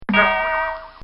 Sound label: Cartoon Sound Effects Bong; Cartoon Sound Design